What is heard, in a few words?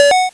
pickup